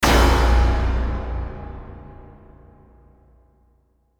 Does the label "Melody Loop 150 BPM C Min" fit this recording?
No